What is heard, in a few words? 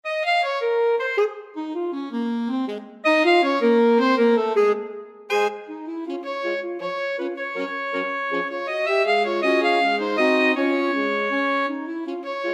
alto sax quartet